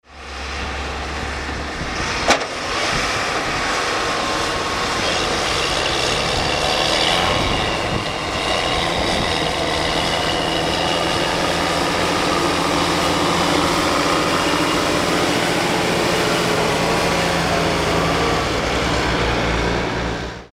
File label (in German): Fendt Vario 930, Motor: MAN 6-Zylinder Turbolader; Hubraum: 6,9 Liter; Leistung: 300 PS